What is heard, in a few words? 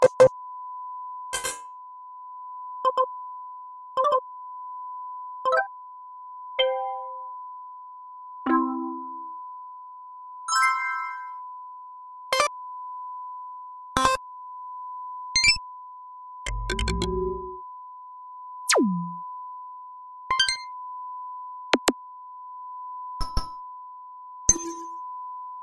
click